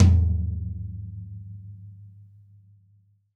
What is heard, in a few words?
TD-20 Tom B, Roland TD-20 Toms